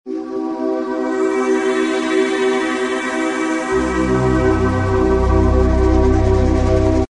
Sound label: angelic accent; fantasy